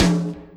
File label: tom, drums; virtual drum sounds; custom drums